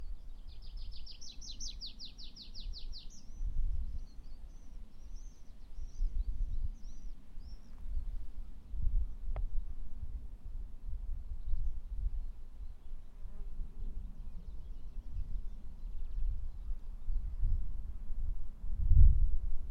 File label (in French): Pipit; MOTACILLIDAE, Anthus trivialis